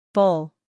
bowl

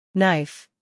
knife

